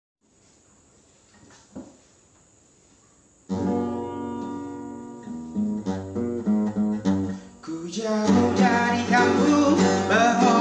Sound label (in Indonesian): Yell Yell; Salam Cinta Dari Ujung Kandang; FAKULTAS PETERNAKAN UNIPA